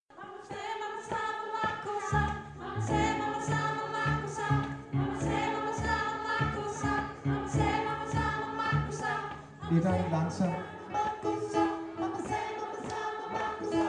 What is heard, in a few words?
sopran; kor